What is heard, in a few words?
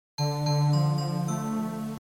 Earcons Copy; oviatt